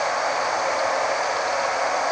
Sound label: road wet, Vehicle; NASCAR Thunder 2004